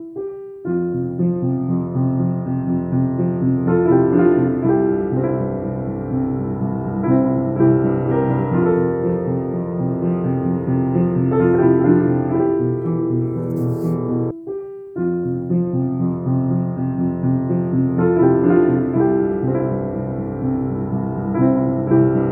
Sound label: Melodie Anders Piano